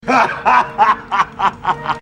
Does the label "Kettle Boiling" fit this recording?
No